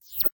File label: Menu Inspector Window Close; Custom UI Sounds; Call of Duty Black Ops 1